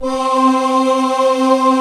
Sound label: CHORAL PAD, Vox & Voice